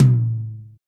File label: Low Mid Tom Single Hit A Key, tom sample tuned to the A note; tom drum